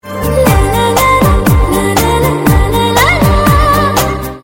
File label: Laa La Lady Voice